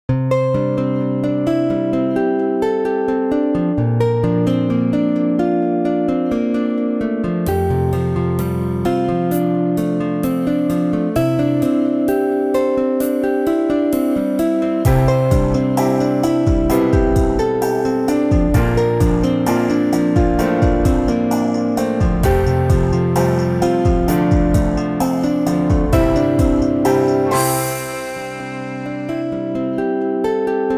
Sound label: chill beat slow; music loops pack bundle